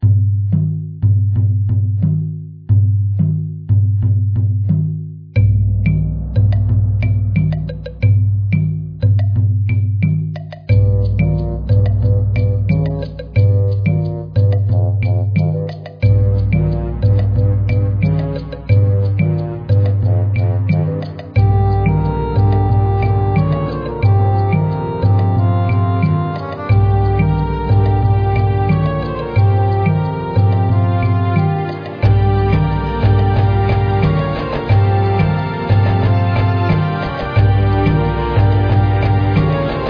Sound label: Tribal